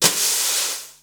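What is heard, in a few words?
snare brush swirl, Yamaha Motif ES drum machine; 300 Drum Machines; Yamaha Motif ES-Series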